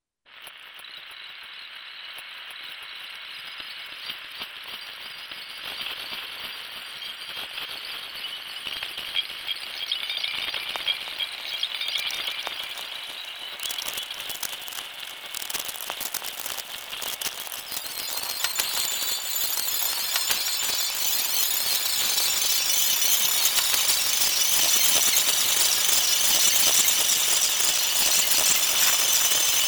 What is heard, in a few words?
white noise